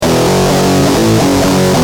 Guitar, Industrial

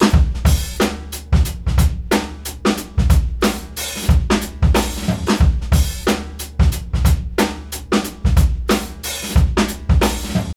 SLOW MOTION 91, OFFMIKE DRUMS

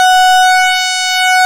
PULSEMOD, ARP Synths

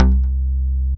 Attacker Bass; Big Fish Audio; Old Skool House; OSH Bass

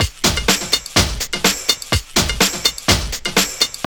TRIP 90, trip loop

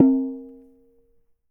CLAY FRAME, CAMEROON; AKAI S6000 CD-ROM - WorldSounds; CAMEROON PRC

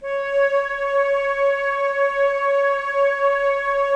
Flute, Woodwind

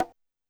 Conga Hi, 505; classic machines

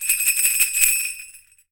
SUZU, JAPAN PERC; AKAI S6000 CD-ROM; WorldSounds

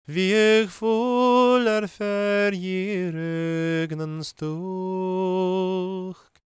GAELIC, World Voices; ILIO; Vocal Planet

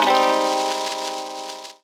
RAW DIRT; Rawcutz; Nu Forms

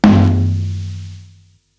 Floor tom, Psychedelic Drums

